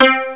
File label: koto mod; Amiga Special - Software Hits; orchestra; keystring